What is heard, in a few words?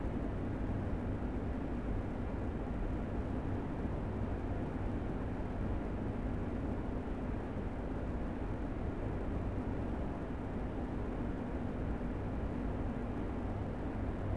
warehouse back lot ambience; Star Wars Obi Wan; XBOX